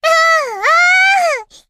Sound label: uuu, uuu